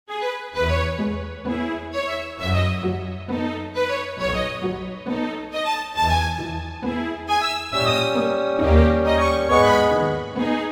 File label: orchestra; ponchielli